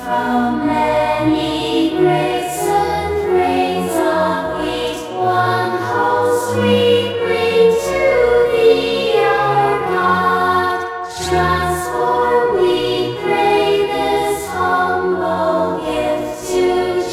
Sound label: choir